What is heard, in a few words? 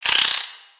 Spider Attack Melee; creatures mechanical; Lego